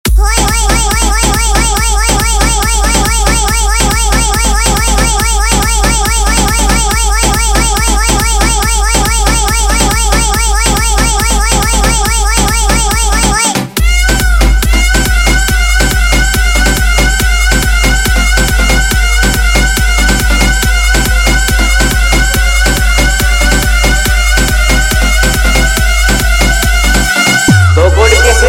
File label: Khatarnak Edm Trance Comptition Full Siti Dialouge Mix